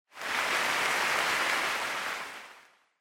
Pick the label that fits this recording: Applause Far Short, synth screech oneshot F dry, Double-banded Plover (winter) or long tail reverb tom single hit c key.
Applause Far Short